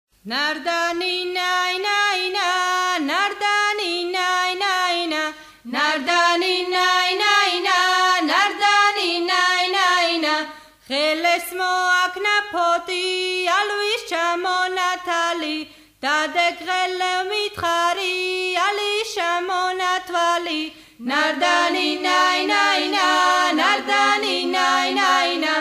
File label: nardanina